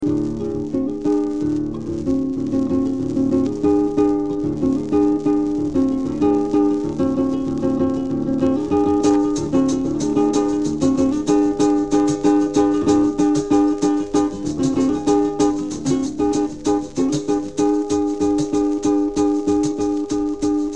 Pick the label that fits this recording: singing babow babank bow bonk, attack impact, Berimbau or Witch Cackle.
Berimbau